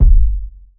Tight Lows Bass Drum One Shot D Key, Royality free kickdrum sample tuned to the D note